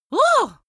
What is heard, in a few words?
outcry boy; vocals; comedy-cartoon; soundpool